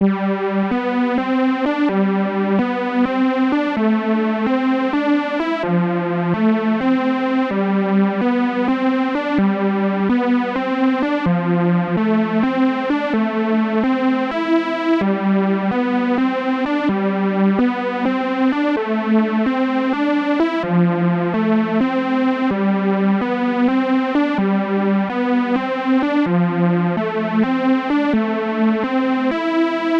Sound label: groove